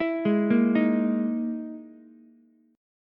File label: E Major 7th Flat 5th, Ukulele; EM7b5 arpeggiated; 9,8,6,6; JGuitar